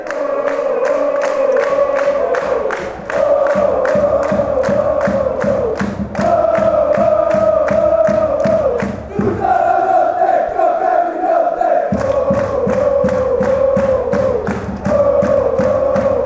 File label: oooh ohh genk, chants